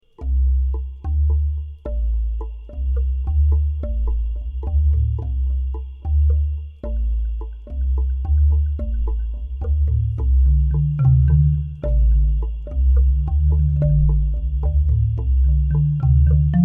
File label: Bass Tone Bar